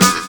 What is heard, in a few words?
HARMONICA